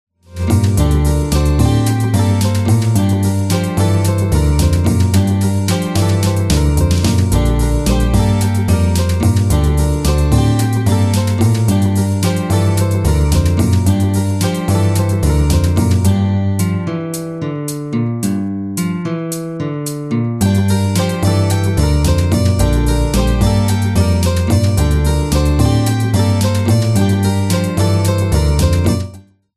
guitar groove

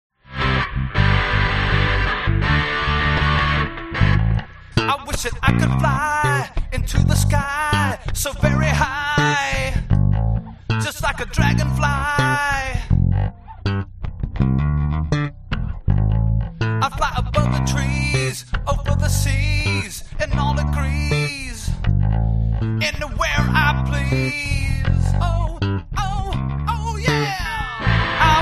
play along drums rock pop and blues minus drums

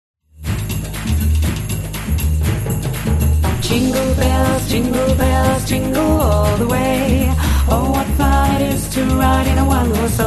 latino christmas jingle bells; Undercover Entertainment

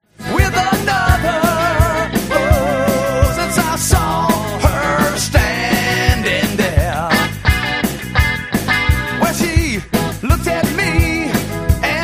play along drums classic rock

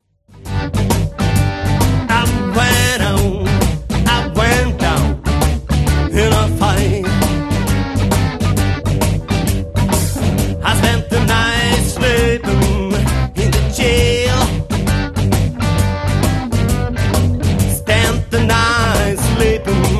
blues

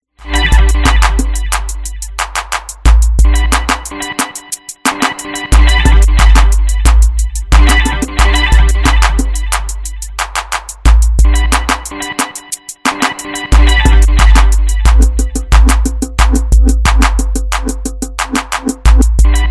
instrumental

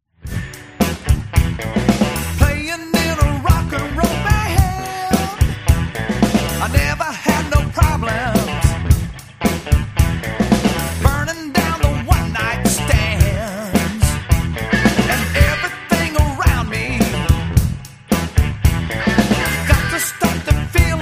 play along drums classic rock

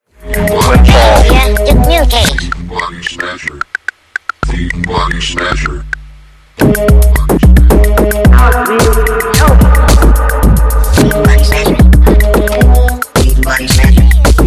instrumental

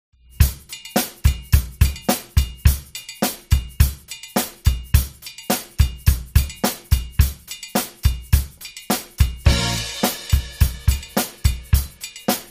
play along drums rock pop and blues

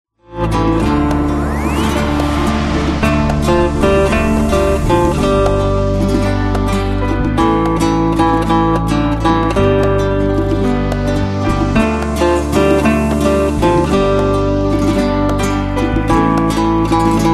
guitar

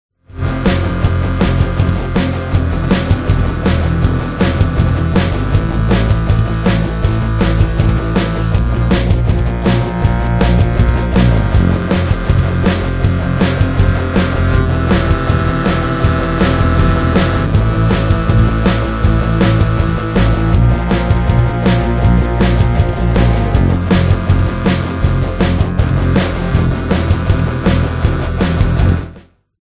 contemporary polish village music

